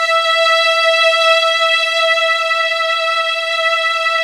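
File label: violin ensemble; 90s Sample CDs; Club-50 - Foundations Roland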